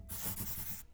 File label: Writing; ArmyRaid; Interface and Item Sounds